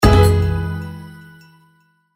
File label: multiplier win; Eternal Wishes LuckyTap